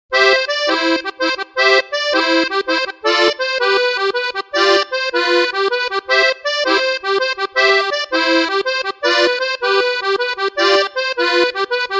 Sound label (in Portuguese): Sanfona